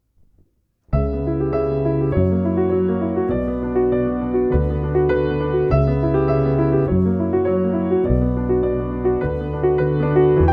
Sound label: Piano Bass; Dichterliebe